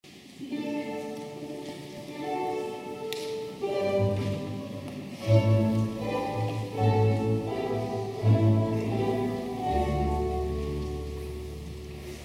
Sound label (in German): Andachtsjodler